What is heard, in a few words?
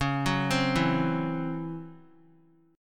Db Minor Major 7th Double Flat 5th Db down; DbmM7bb5 arpeggiated